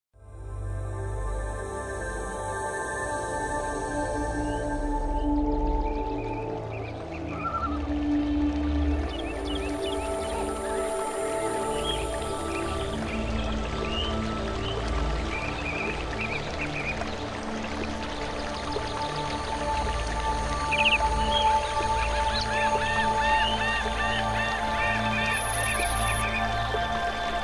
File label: Exploration Soundscape country picnic; ביטחון עצמי לילדים עם מוזיקה וקולות פיקניק בטבע; מוזיקה למדיטציה וקולות פיקניק בטבע